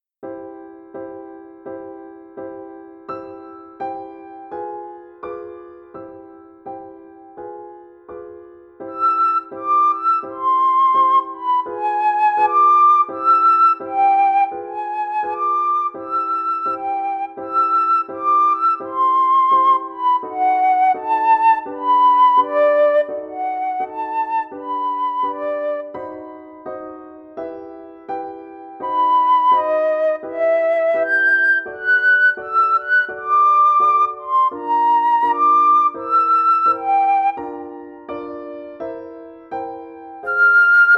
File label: Piece for Flute and Piano